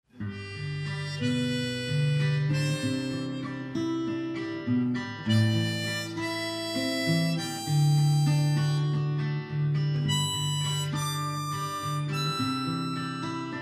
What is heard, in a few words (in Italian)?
CHITARRA E ARMONICA ARPEGGI